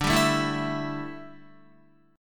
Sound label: D Suspended 2nd Suspended 4th D down Guitar